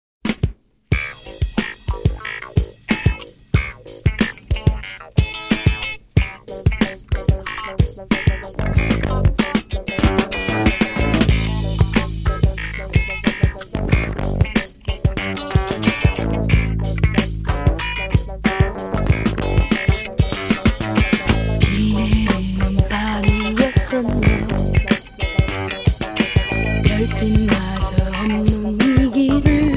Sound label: funky Korean soul